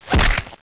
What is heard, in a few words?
axe; Caesar 2; DOS